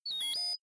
bot default, robot

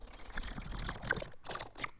slurping shoggoth, monster

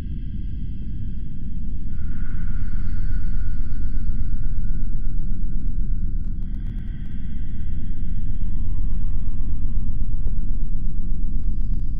underground, ambience and weather

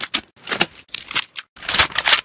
reload, mg; guns

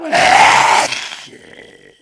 zombie death, monster

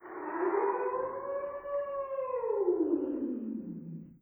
mon wolf, monster

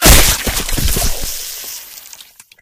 gibbed

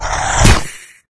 bite miss

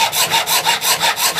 hacksaw, tools

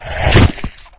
bite hit

